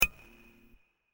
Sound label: tick